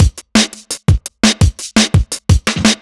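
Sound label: Drum and Bass Beat 170, drumnbass 170bpm; musicradar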